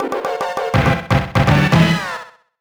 Race Start Fanfare; mario kart tour original soundtrack; SNES

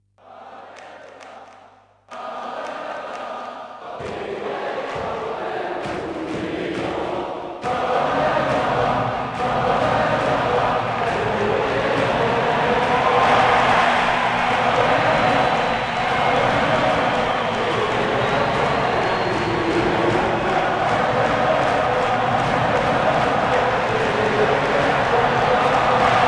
Chants - European Type

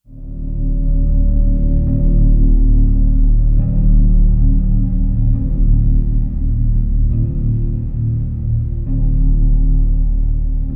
Tense Ambience; There's Something In The Distance...

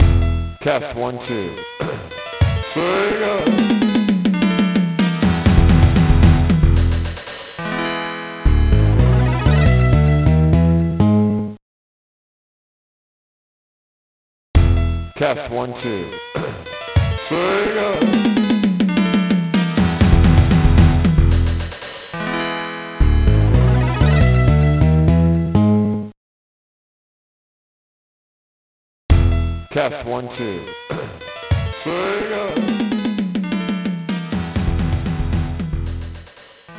Logo; Sega Logo; comix zone 2002 gba

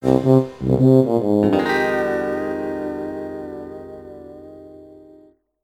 Coin Challenge Failed

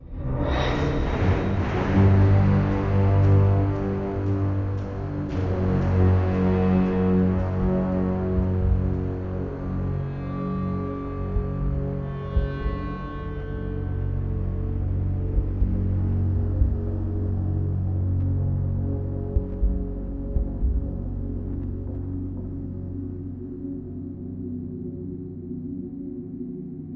Trap Music; Grey Teeth CS Gryphon

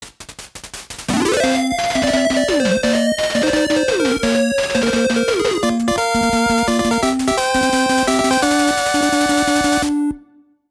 All Stage Clear; Mega Man 4 Complete Works; NES

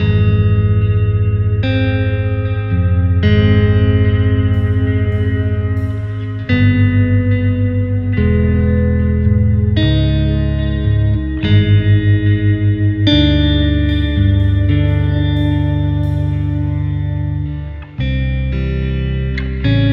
Police Station Puzzle Loop; The Detail ios linux macos windows gamerip 2014

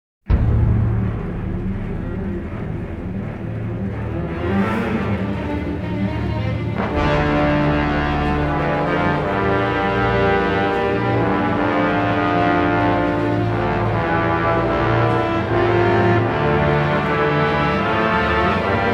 Orchestral Version; battlefield bad company; Battlefield Theme

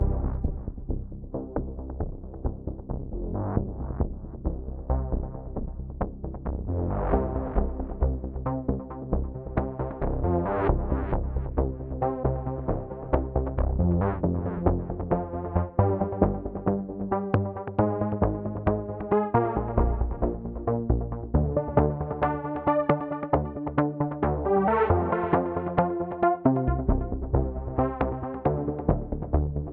Loop Tonal Rhythm; 32k 16b; Battle Breakers; android ios macos mobile online windows gamerip 2019

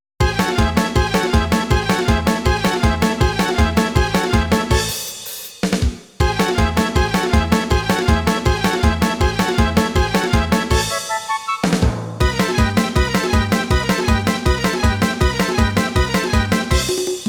SYNTHE; kenshuui tend dokuta original soundtrack

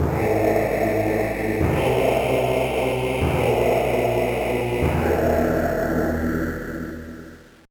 Gate Opened; Metroid Zero Mission; Tourian Gate Opened

